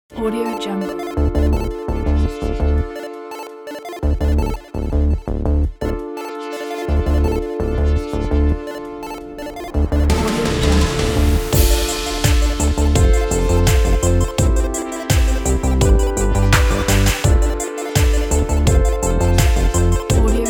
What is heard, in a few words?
retrowave chiptune